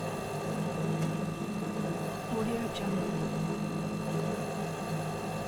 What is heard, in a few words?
air conditioning clunky external unit loop